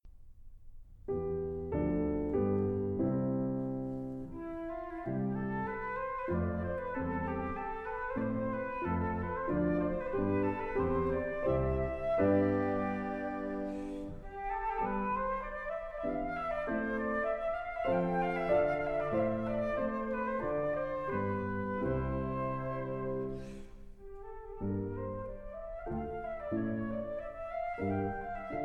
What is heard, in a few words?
TIMPANI